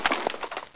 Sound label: rpg grab